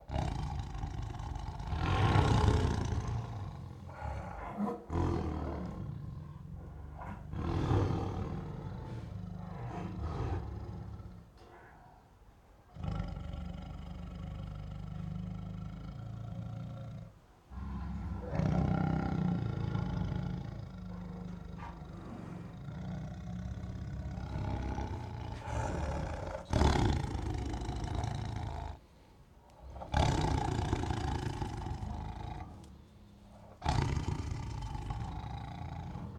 Annoyed and Stalking Growls, Tigers; Sound Ideas

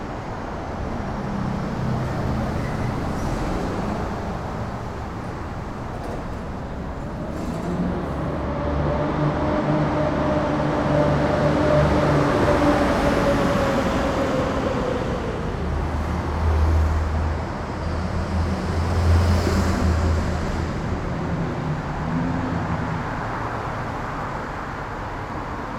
Urban traffic busy free flowing; urban and suburban; boom library

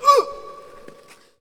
Hockey Male Hurt; Hockey Vocal Sounds

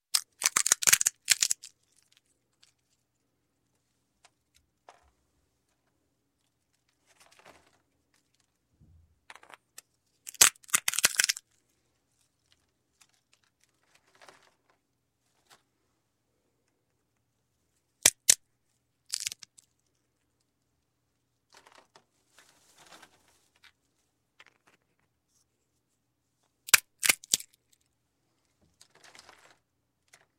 Nut Crackers Cracking Several Nuts; Sound Ideas 2000 Series

